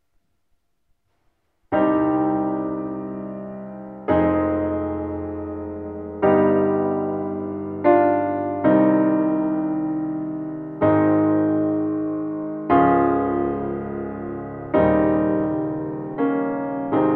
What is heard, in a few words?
Chopin Prelude 6 C Moll am Steinway